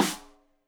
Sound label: Rim Shot Stereo; Deeply Sampled Drums; SMDrum